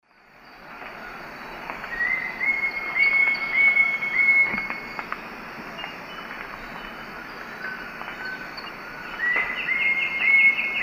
Celeus torquatus, call; S. America; Eastern Lowlands

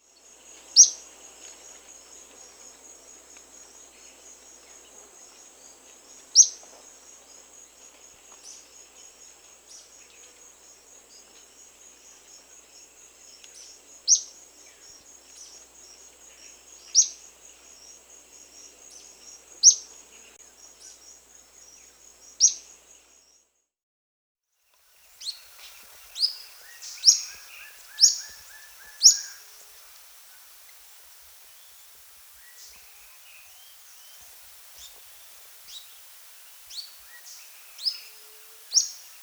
Yellow-breasted Flycatcher (Tolmomyi, S. America

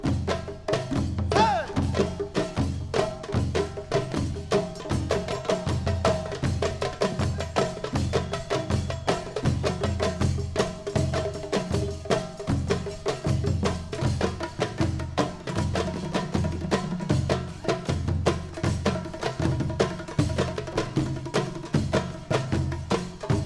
drum Song; World Music

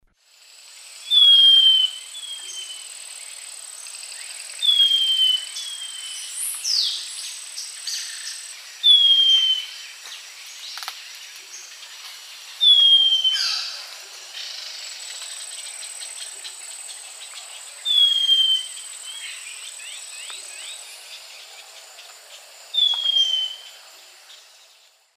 Microbates collaris, song; S. America; Eastern Lowlands

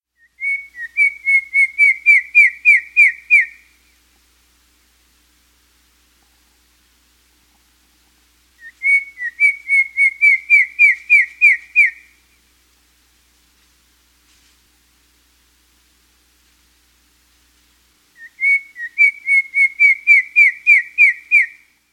White-Browed Antpitta; S. America

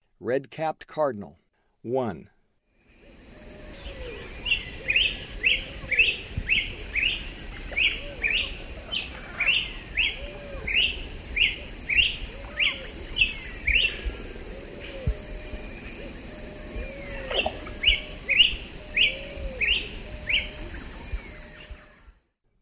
Red-capped Cardinal; S. America; Eastern Lowlands

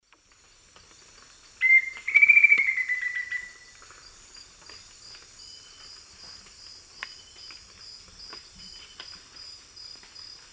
Formicarius analis, song; S. America; Eastern Lowlands

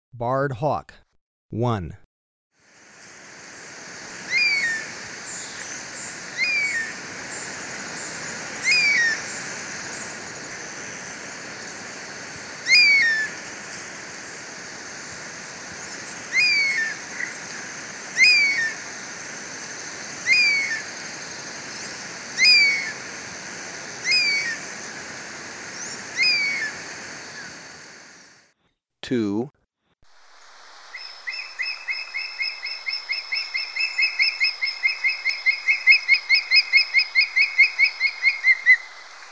Barred Hawk, Birds of Ecuador; S. America

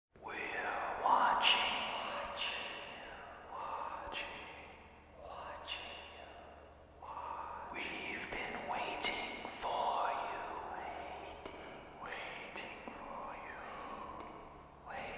Whispers; Gothic; World Music

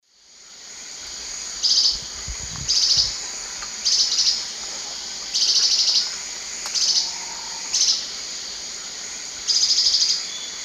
Hylophylax naevius, terra firme, call; S. America; Eastern Lowlands

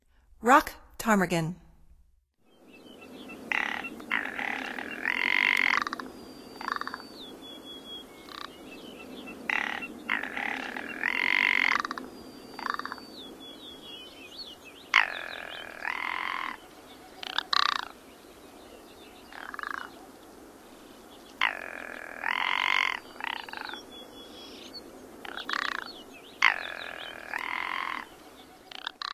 Rock Ptarmigan; North America; Stokes

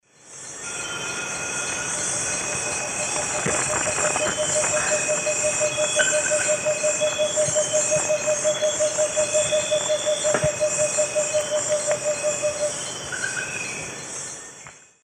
Megascops watsonii, song; S. America; Eastern Lowlands

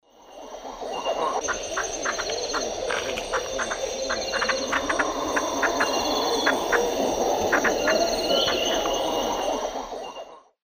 Cyphorhinus arada, calls; S. America; Eastern Lowlands

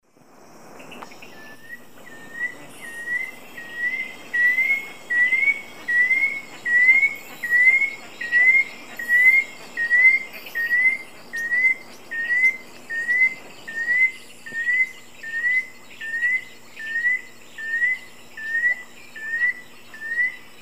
Amaurolimnas concolor, song; S. America; Eastern Lowlands

